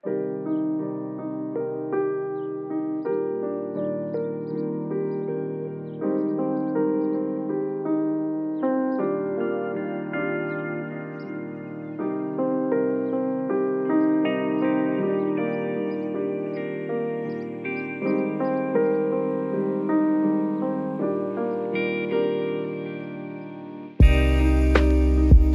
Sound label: lofi hip hop; beats to relax study